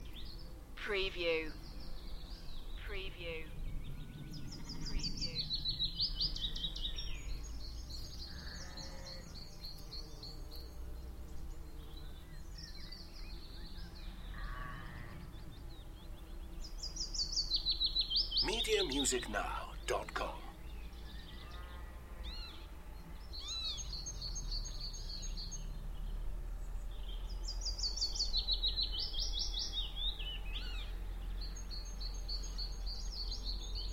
Spring birds song bumble bees with tractor drive by and aircraft noise; Beacon Fell; beanstalk audio